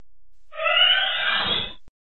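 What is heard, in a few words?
banshee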